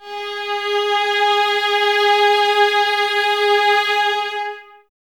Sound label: violas G#4, String Ensembles, Exprsive; Miroslav Vitous